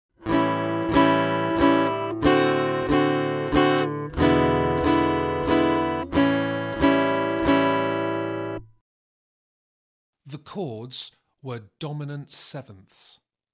Aural Harmony Test, acoustic guitar; Grade 1

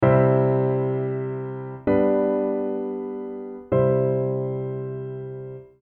recognition of chords; Grade 1; pop vocals

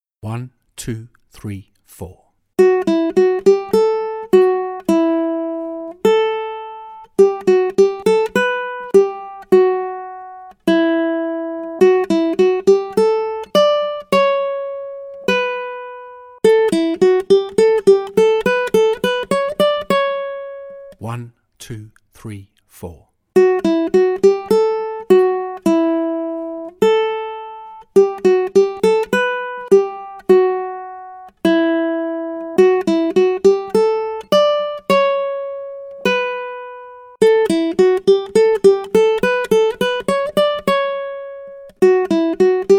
Accompaniment, ukulele; grade1

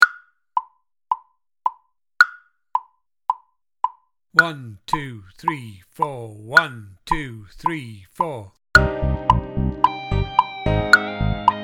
Moon Rock Click, drum kit

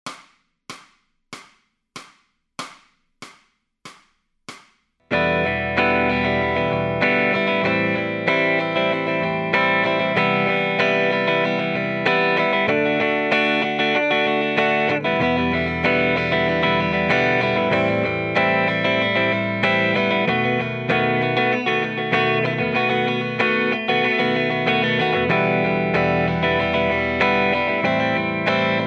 Lead Backing Track, electric guitar; Grade 5

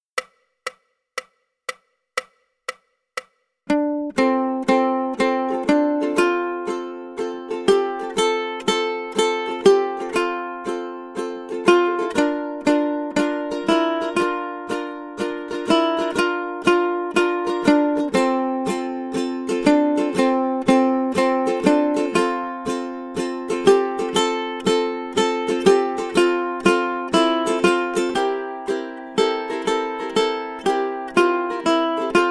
Worried Man Blues, ukulele

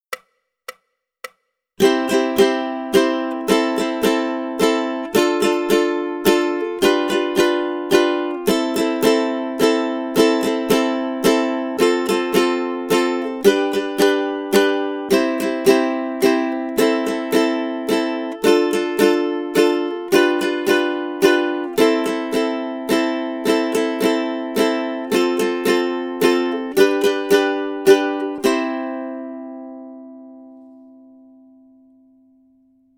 Rhythm Study, ukulele

